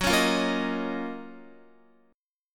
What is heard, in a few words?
Gb Major 13th, down, Guitar; GbM13 strummed; JGuitar